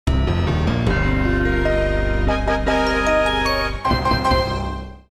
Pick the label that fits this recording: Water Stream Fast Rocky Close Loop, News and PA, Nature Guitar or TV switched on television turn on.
News and PA